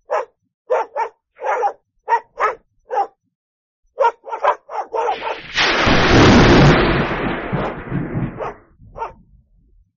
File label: dogs barking lightning strikes; Prompt: Dogs barking, then lightning strikes